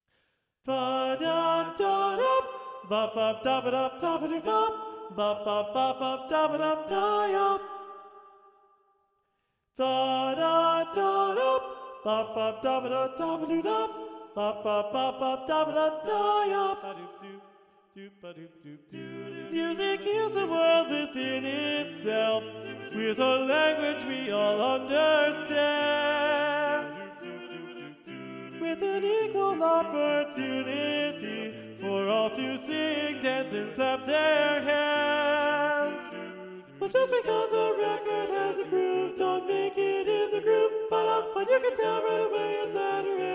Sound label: Alto; Practice Room